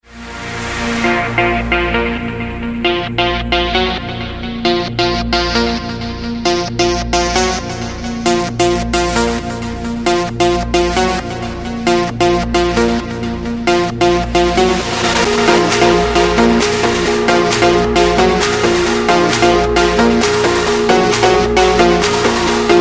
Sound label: bounce trance